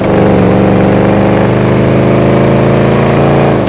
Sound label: third, vehicles; gmod; tdmcars; gtav; gauntlet